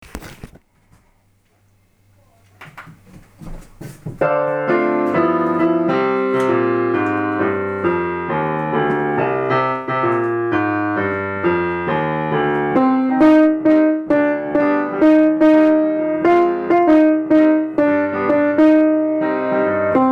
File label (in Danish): alt stemmen